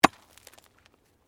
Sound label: Digging Pick Hard Earth; Various digging tools and processes; Construction